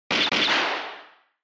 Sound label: Censor Bleep Gun